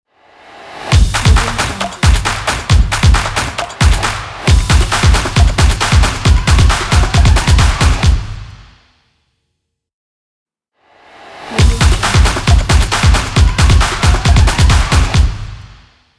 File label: logo football